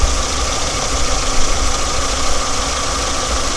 renault symbol, Vehicles

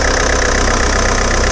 opel astra, vehicles

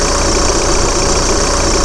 golf, Vehicles

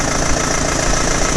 bmw, Vehicles

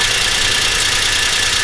matiz, Vehicles

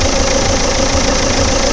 renault megane, Vehicles

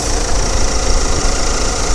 renault megane, Vehicles

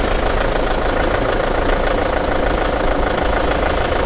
citroen, Vehicles

